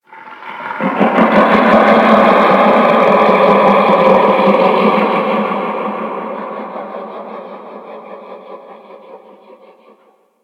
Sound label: Ice Creature Distant Cry; Ambience; Warframe; Corpus Ice Planet